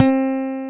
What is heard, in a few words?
E BASS HI; Back In Time Records; Big Bang; THE ROCK